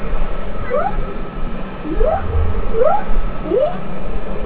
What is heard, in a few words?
right whale